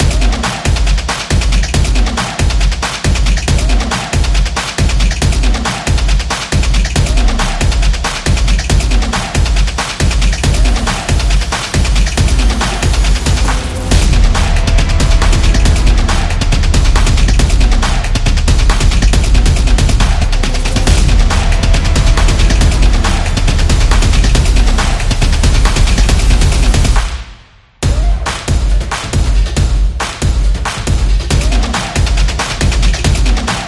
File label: Cinematic Sport